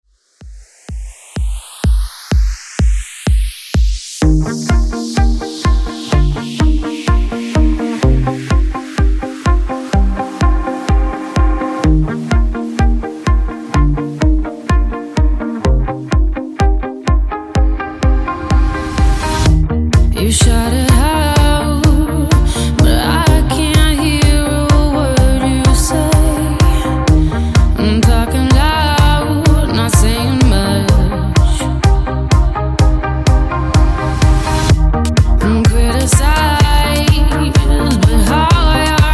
Throwback Redrum 126 Bpm Clean

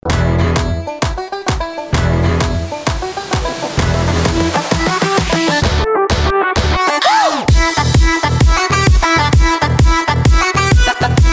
Club Party Banger

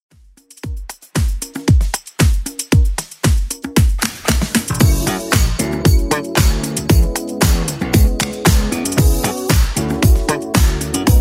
80s Club Edit, 115 bpm

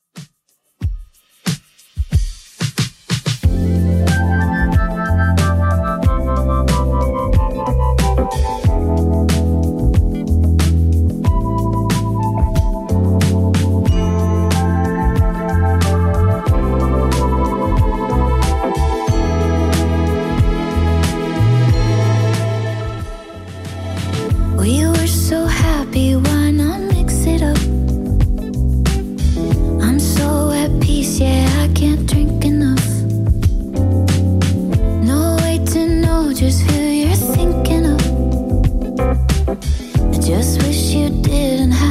Top40 Redrum 92bpm

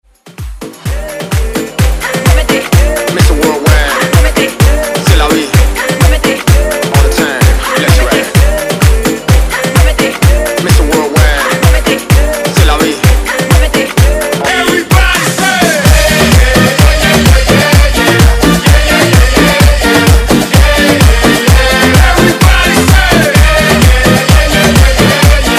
House Redrum

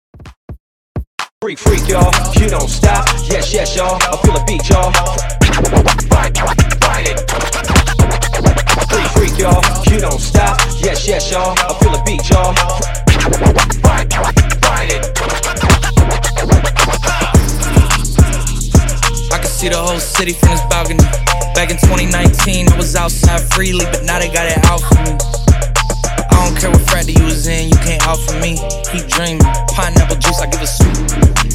Funk Transition 128 107Bpm

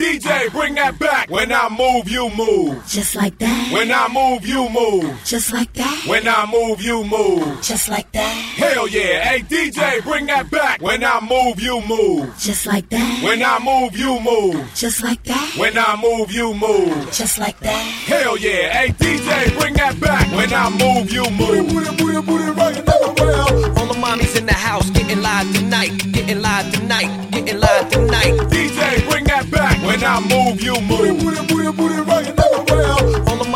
Acapella Intros 101 Bpm Dirty

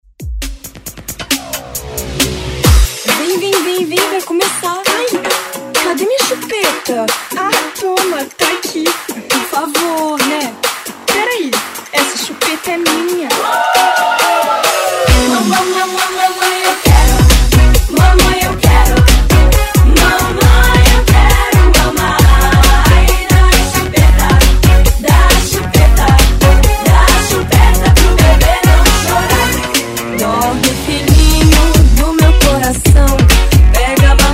90s Funky Redrum

